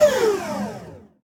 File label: Servo Down